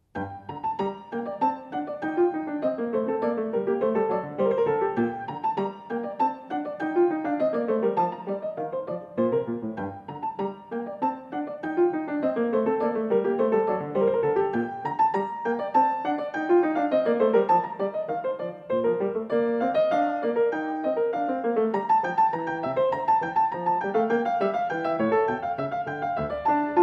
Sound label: English Suite No 3 in G minor, Gavotte I (ou la Musette); Bach; BWV 808